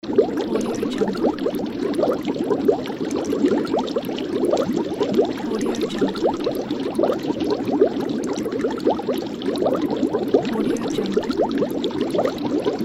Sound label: water bubbles